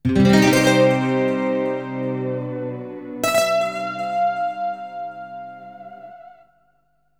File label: ELECGUIT, AMBNT, 160, C; Ambient; Guitar